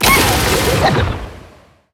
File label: death; little horn